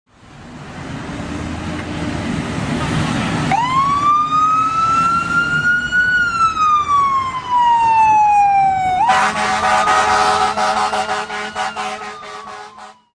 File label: engine responding code 3 with the air horn; minneapolis engine 7